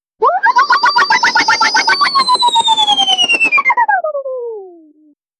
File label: fast exaggerated cartoon